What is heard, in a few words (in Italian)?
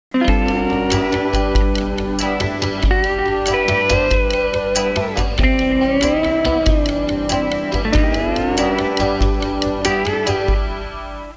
suonerie musica hawaiian